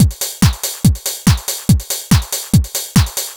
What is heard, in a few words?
Dance Drumloop; Drums; Dance Beats